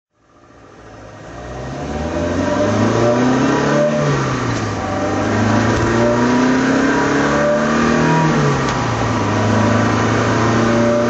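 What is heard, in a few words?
jensen healey driving, engine sound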